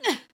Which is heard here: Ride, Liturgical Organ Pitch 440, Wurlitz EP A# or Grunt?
Grunt